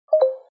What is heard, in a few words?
mic off